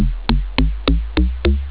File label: glug glug; ST-XX Amiga Soundtracker Sample Packs